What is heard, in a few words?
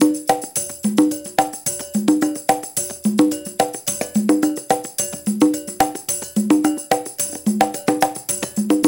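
108 bpm, Classic Conga; Spectrasonics - Supreme Beats - World Dance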